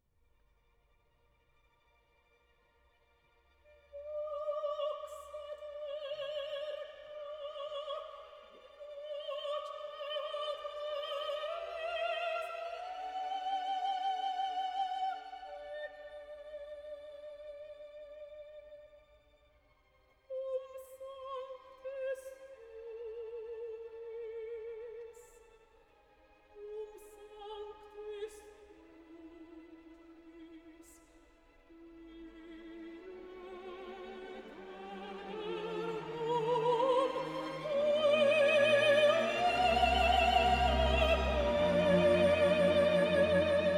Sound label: Lux aeterna, Mezzo-Soprano, Tenor, Bass; Giuseppe Verdi; Verdi Requiem